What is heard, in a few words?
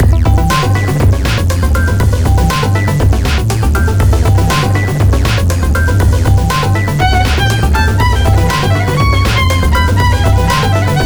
Running Music